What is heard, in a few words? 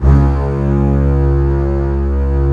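C#2 contrabass; C-BASS1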